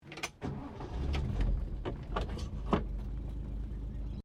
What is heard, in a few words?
CAR HOLDEN FE INTERIOR IGNITION, Classic Australian cars